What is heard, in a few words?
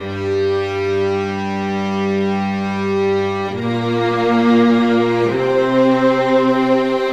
strings, Sad Loops